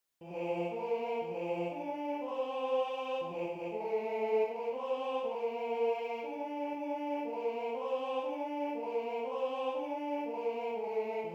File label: Tenor II; Mir wuenschn enk alln D Wuensche; d Ankloepfler